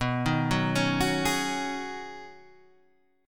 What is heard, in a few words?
B 6th Add 9th B Guitar Standard, chord; arpeggiated